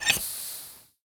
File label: gascan out; Rust